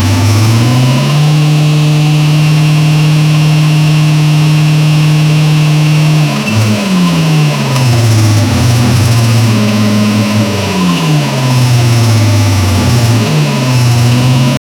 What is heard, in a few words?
18 tsi engine revving; automobile